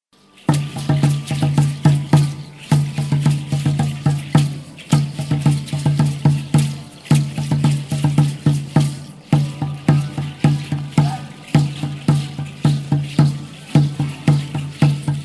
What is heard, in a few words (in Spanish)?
Sonidos Danza